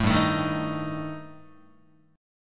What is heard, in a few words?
G Suspended 2nd Sharp 5th G, Guitar, 4A, 4E, 5A, 5D, 5G sharp, 6C sharp; strummed; 0,3,0,1,1,2